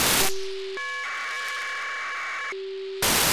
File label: Cape 437MHz325 1k2 AFSK beacon, satellite; Cape-3; On October 31st 2022 at 12:30 UTC Daniel DL7NDR received on 437.325 MHz the 1200bd AFSK be